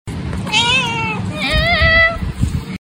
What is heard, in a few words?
cat human